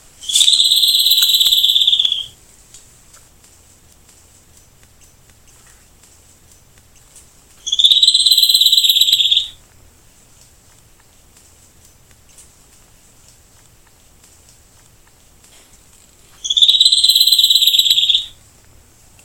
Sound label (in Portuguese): Formigueiro, Hormiguero Festoneado (Myrmoderus ruficauda)